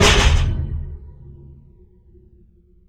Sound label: BIG SMASH, PERC METALIC; Rarefaction; Poke in The Ear With A Sharp Stick